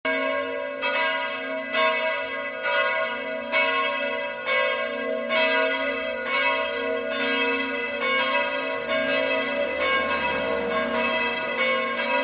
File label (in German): glocken leonhardskirche